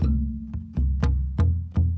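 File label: Calm Far, music; From Dust; PS3; Xbox 360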